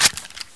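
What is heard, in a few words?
bolt forward, weapons ACR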